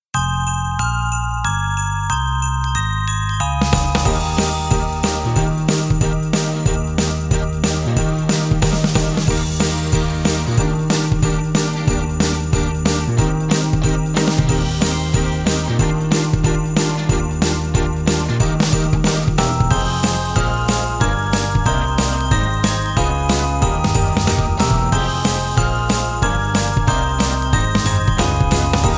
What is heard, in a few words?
Inst Mix